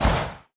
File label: Ion Thrust Combined Loop; Player